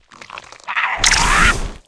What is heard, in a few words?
antra lug attack, monster